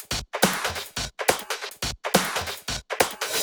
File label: Loops 140 BPM Hihat Percussion Loops